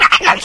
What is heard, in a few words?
talk, headcrab poison; Source Engine; video game; npc